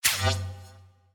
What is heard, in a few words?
Log Open